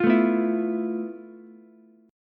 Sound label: F Major 7th Flat 5th Ukulele; strummed; JGuitar